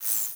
Science Fiction Sci-Fi Electronic Frequency Disruption